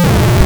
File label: slam; Keen 3